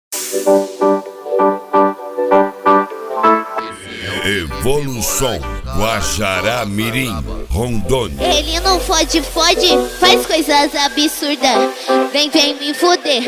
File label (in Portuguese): noia dance